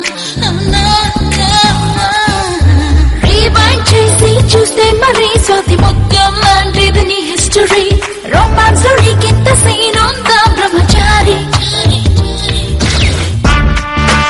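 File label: background music Ringtone; Adhurs; Chari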